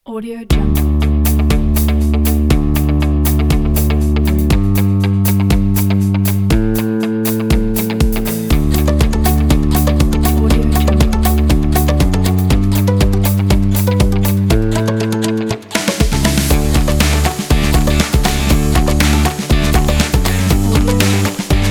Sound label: corporate rock